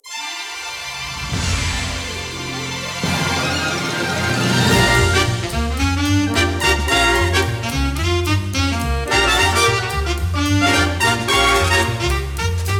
Promo; Family Guy

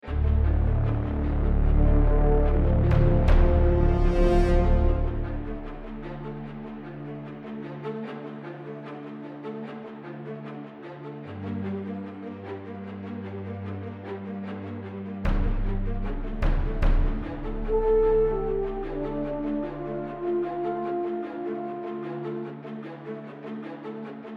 Drama Tension

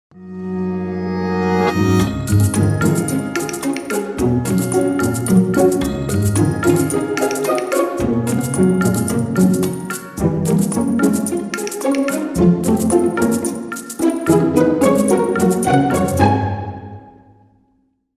jingle

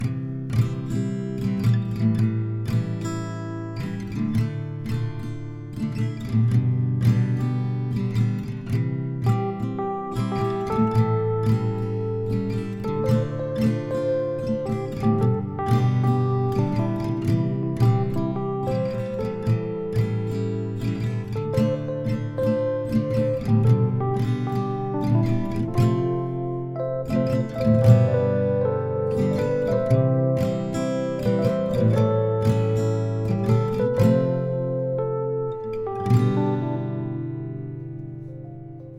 Melancholic Instrumental

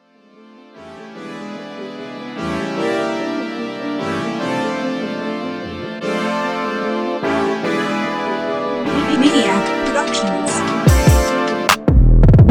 Soft Synthesis

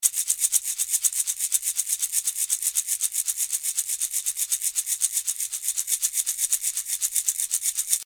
120 SHAKER LO SIXTEENTHS

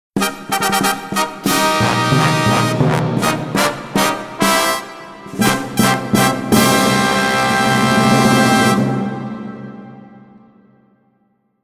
King Louie Fanfare

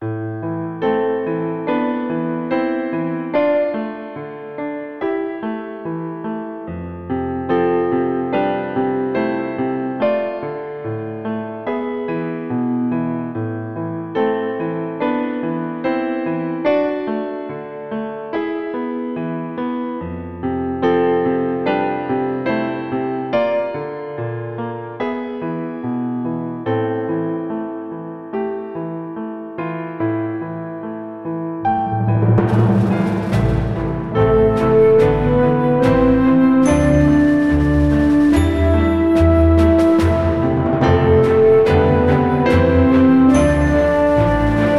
Calm

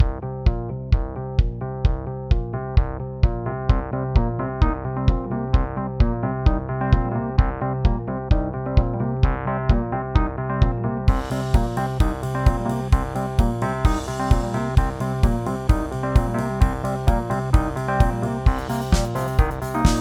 disco funk bpm130; archibald editions

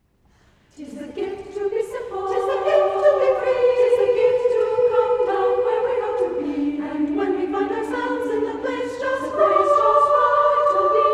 American Shaker Song; 'Tis the Gift to be Simple

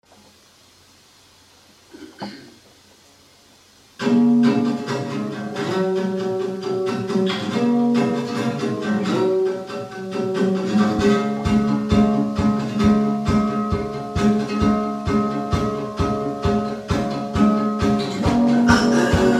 Lo-Fi

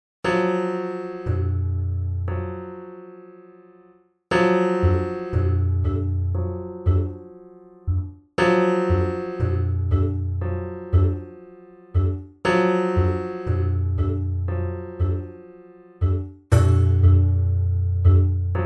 five keys

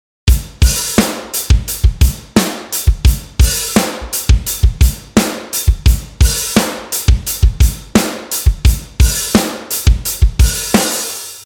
86 DRUM LOOP

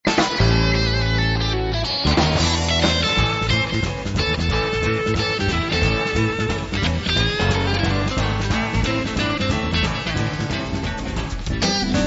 blazing blues

